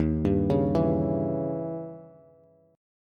D sharp 7th Flat 9th Bass; arpeggiated; JGuitar

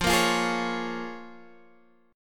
Bb Minor 7th, guitar

